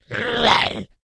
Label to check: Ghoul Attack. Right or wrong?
Right